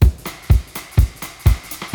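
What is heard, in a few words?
RUSSIAN RHYTHM, BREAKBEATS; Crypton - DrumBase5000; BREAKBEATS#2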